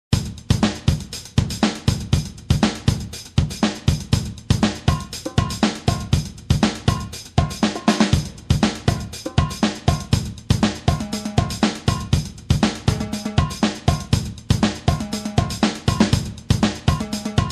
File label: funk fizz